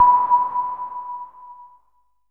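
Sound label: sonar, analog synth; AKAI S-Series CD-ROM Sound Library VOL-6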